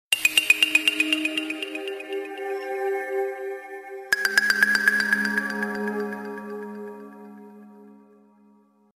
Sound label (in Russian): standartnoe sms na samsung galaxy pocket neo